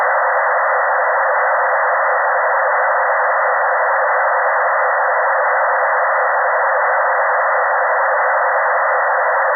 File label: Pink PN Spkr -12 dBFS